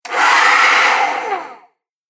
Hairdryer On Then Off

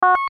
BLIPS TONAL; Beeps; Multimedia

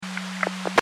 Emergency Police Radio Static; Sound Design; SFX Bible

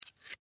Scissors Open; Scissors Open Hair Barber Salon; Scissor Sound Effects; Offices; Foley; Blastwave

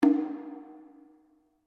DRUM TAIKO SHIMEB F, Small Japanese Taiko drum; Miscellaneous Musical

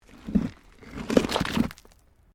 Rock Move; Material components for constructing more complex sounds; Rocks; Materials

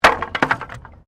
Wood Fall

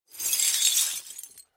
Crash Debris Sweep Glass; Sound Effects:Materials; Blastwave

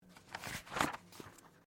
Book Page Turn; Book movement; Books (Sound Effects:Offices)

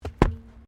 WRECK WHEEL STANDARD BOUNCE SINGLE, Wrecked Cars; impacts, crashes, scrapes; Crashes (Sound Effects:Objects); SoundEffects-Foundation4

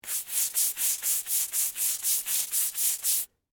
Household, Cleaning, Brush, Scrub

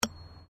Digging Small Handheld Tool Rocks

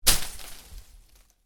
Foliage Impact, Medium Foliage Hit; Impacts; Miscellaneous Impact

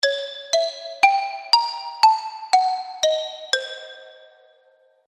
Xylophone Horror Arpeggio, Musical Sound Effects

